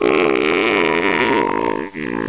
cloud close

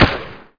M4 one; SpecOps2MPlayerDemo

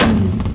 antilag ext; Rally Xtreme; cars

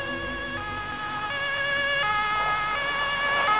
krank; winpolis

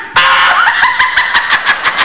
(GIGGLE)

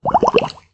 bubles; Fish Fillets

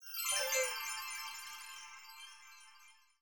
colide thing; wob2 demo

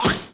hit; BESTSHOT; noisybit

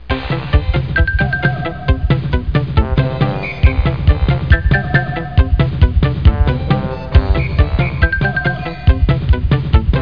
background music

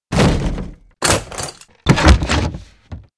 Cannon; FantasyTennis

